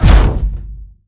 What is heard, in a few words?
hit human; Blind Drive